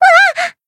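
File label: Voice damaged, Japanese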